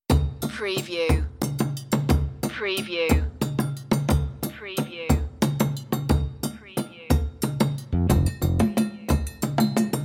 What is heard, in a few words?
serengeti plains bass and drums